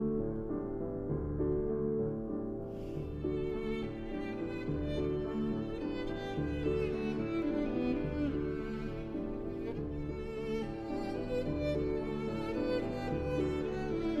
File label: Violin and Piano in D